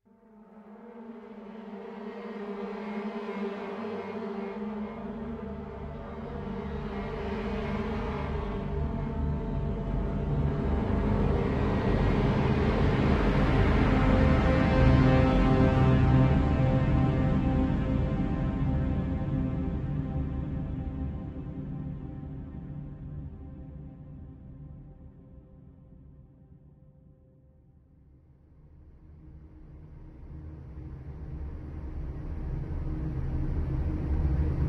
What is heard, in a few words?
Explosion